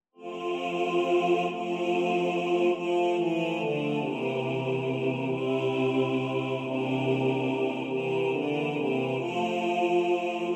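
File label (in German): Bass2 im Vordergrund, Männerchor; Hudri schöane Marie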